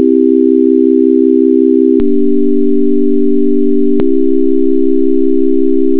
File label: chord